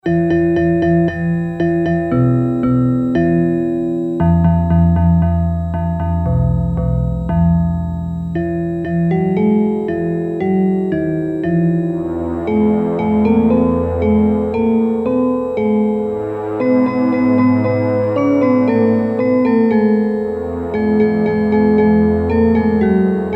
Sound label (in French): Gentil carillonneur